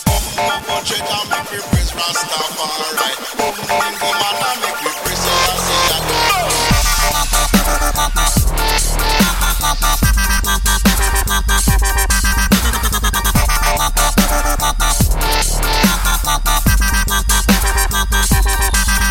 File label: Ragga